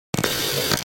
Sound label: camera zoom